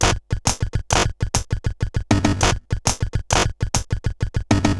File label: Bent RX17 (100BPM), cyborg beats; musicradar